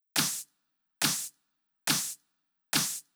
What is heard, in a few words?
drum Loop 140BPM Clap